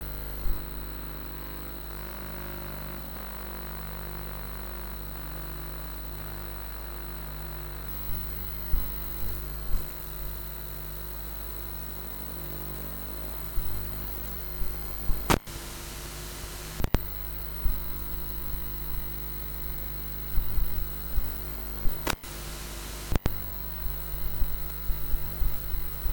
VHS Tape Noises; SFX; IRL Recorded

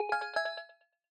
Bells

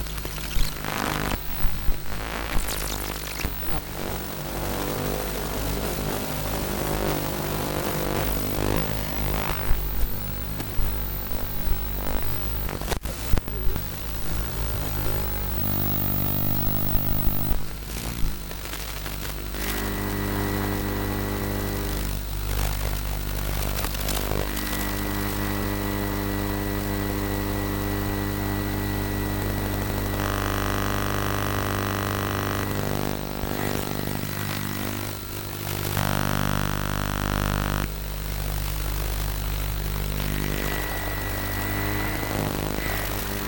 VHS Tape Noises; SFX; IRL Recorded

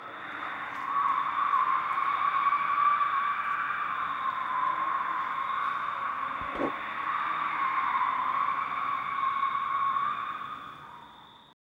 Car tire squeal distant; SFX; IRL Recorded

